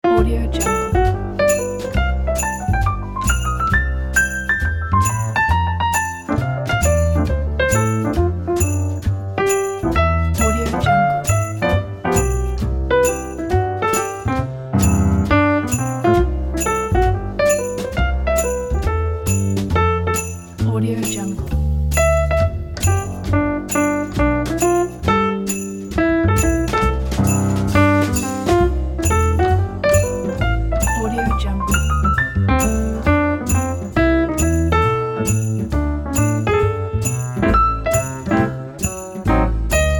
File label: santa swing